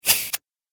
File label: Robot Close Device; Robot Sound Effects; Sci-Fi Robot Sfx; Andrew Fleming; Twistedtracks